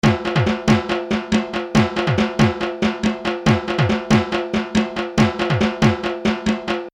dhaak

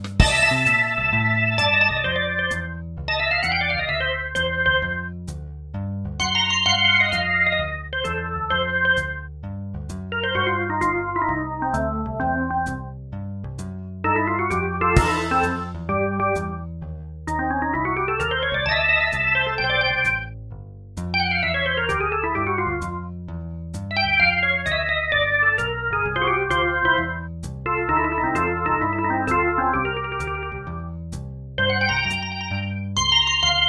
soft blues

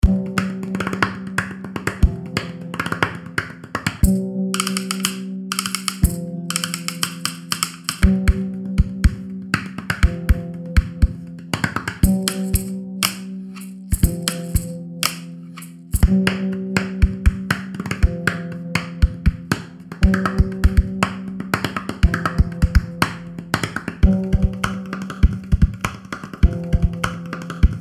calabash beats

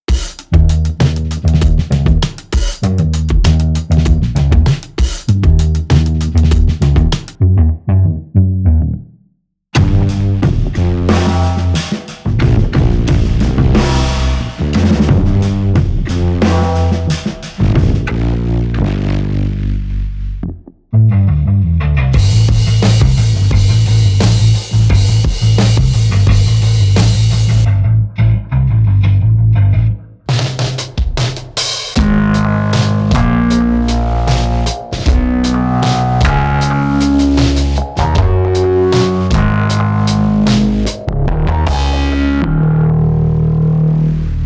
root bass bass

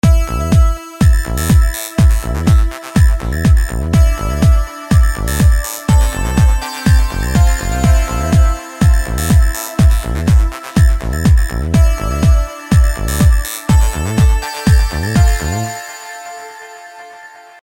deep house

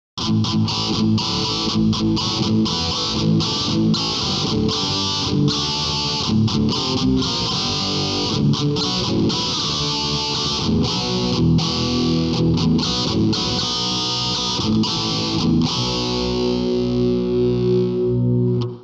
amp combo

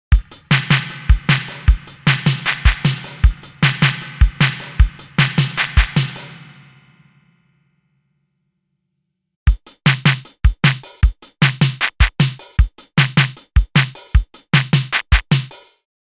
drum wet dry; dsk

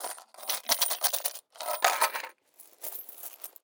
copper coins foley metal

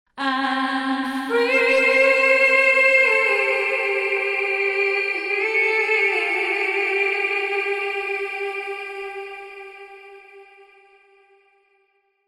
repeater delay wet

